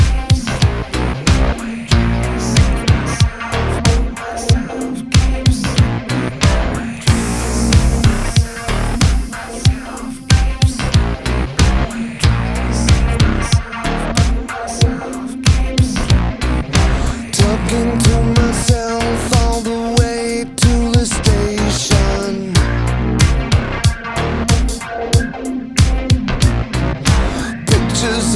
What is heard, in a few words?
Intro Cinematic Cue; Entrati; TennoCon 2023; Cinematics